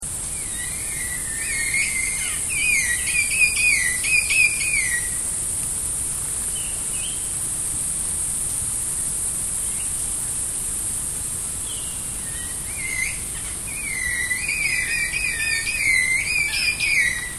blue bellied parrot

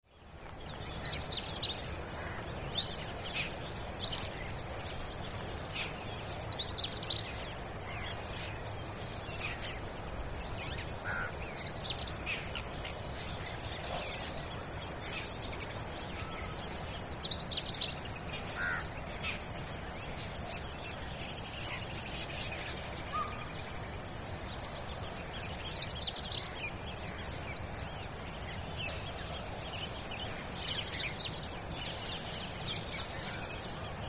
indian robin

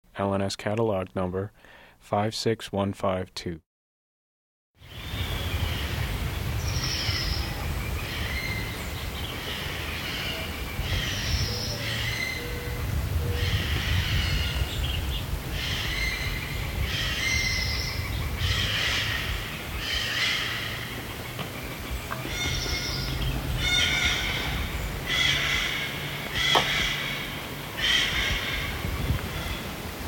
yellow billed parrot

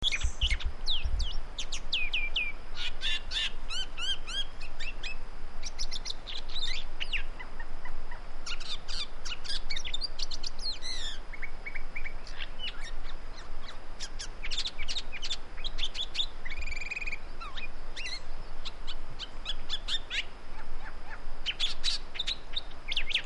great woodswallow

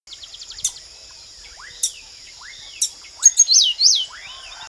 prevosts ground sparrow

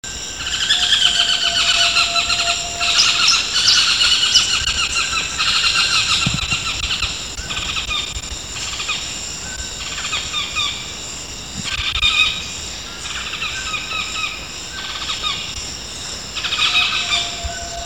yellow tufted woodpecker

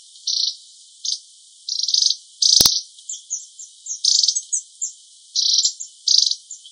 jamesons firefinch

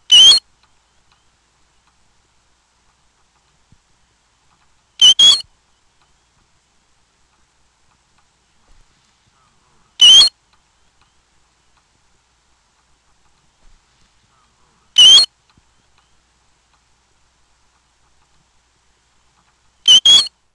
american golden plover

